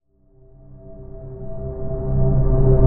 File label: Riser C; Rebound; Ghosthack